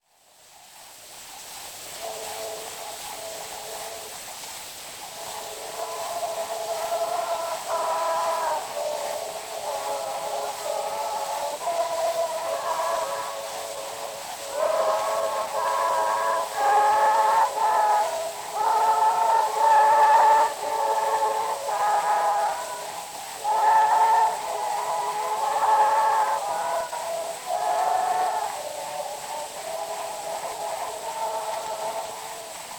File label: Zulu recordings; Father Franz Mayr; Hymn